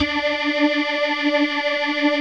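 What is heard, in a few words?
dance organ D2; Best Service; Advanced Media Trax Modern Composer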